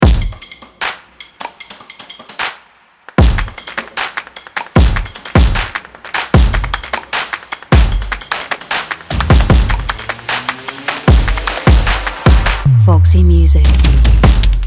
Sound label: Percussive Trailer; Foximusic